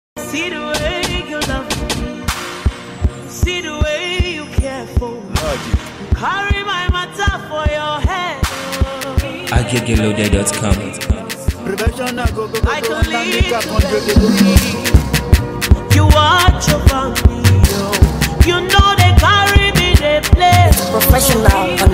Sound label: Beat